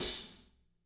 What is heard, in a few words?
16 HAT, DRY KIT